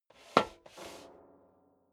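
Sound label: step leather shoes metal rattle scuff, footsteps; schritte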